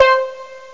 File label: Steel Drum